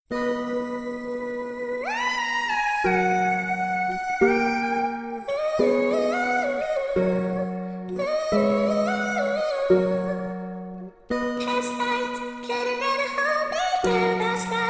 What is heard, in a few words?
Ooooaoo; Super Mario Maker